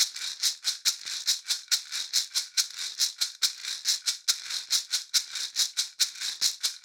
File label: 70bpm shaker maracas loop